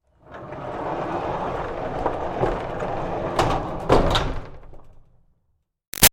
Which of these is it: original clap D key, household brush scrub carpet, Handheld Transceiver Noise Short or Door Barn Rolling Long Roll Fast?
Door Barn Rolling Long Roll Fast